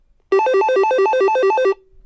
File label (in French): Telephone GIGASET DA710 Sonnerie